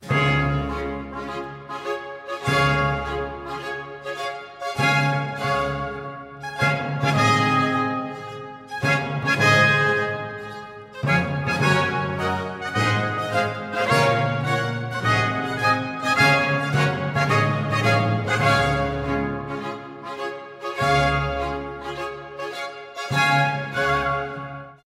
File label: Telemann Trumpet